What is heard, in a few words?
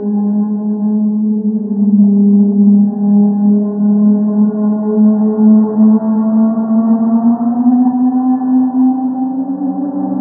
teleport windup loop; Half Life Model Pack; Half Life 2; ambient levels citadel